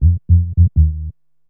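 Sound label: synth bass, drum and bass, 160 bpm, C; eJay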